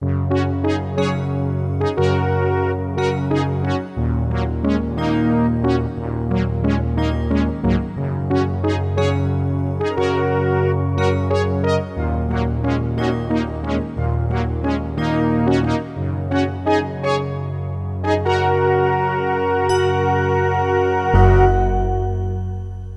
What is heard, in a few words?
Fanfare; Ultima VII The black gate and The Serpent Isle